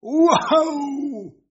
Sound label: win shake; octopus